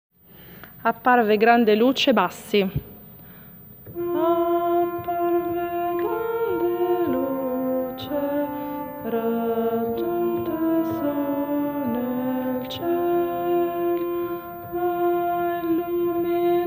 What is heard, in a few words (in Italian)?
bassi